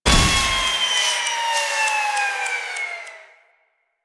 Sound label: Railjack Weapon Recharge; Modular Weapons